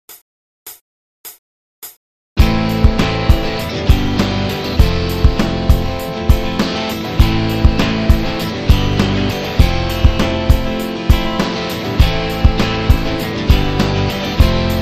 stair jam

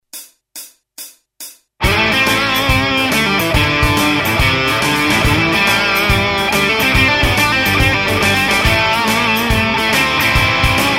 shuffle mania

